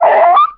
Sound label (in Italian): Cane ferito, Verso di un cane quando viene ferito; Il JukeBox di Lara